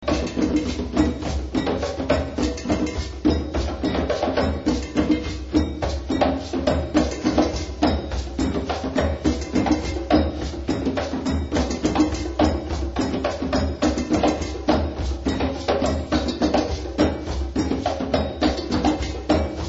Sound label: Nokope